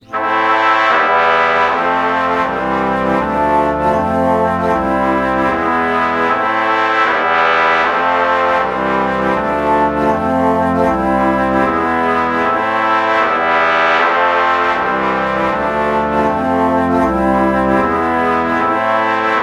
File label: alto flute trumpet chord morph